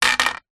household broom plastic metal fall over bouce hard ground; zapsplat